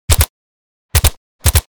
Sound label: MP5 submachine gun two three round bursts; war